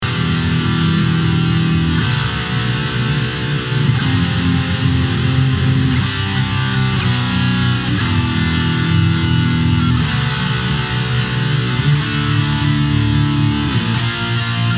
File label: metal test